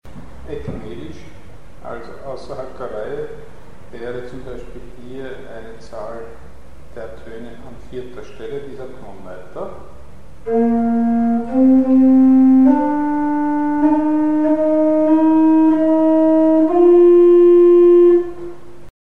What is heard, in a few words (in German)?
ekmelisch; Mikrobuch